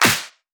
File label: Claps; Vengeance Samples